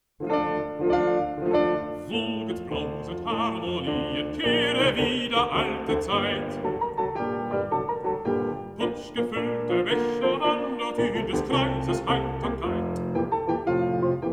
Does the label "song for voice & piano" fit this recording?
Yes